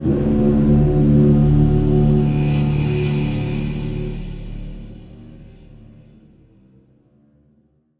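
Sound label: music heroes stinger; heroes of the storm full gamerip 2015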